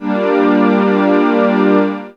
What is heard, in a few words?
synth, g, Techno Chords; Dance Synths